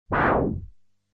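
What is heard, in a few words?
like a shot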